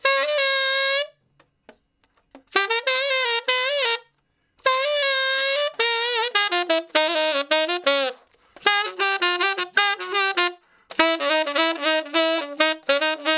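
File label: Sax Shuffle (Bb), tenor sax; Crimson Blue and Fabulous Horncraft 4 RnB; Horncraft for R&B; Solos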